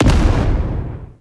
Explode

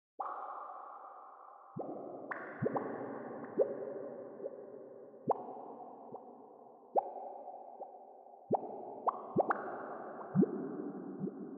Boot Screen Ambience; gamerip; Splatoon; Wii U

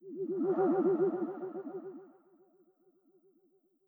Run Necro

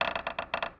Windmill Creak

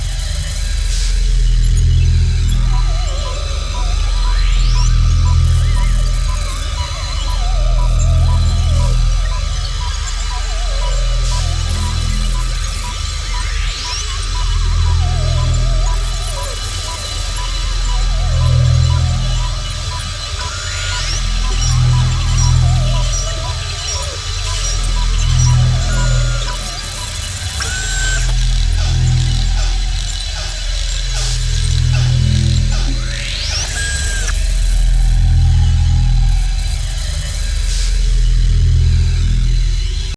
Amb Kitchen

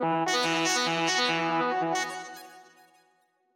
Sax; Sx Special-Photo Impossible-Triangle Sax 01

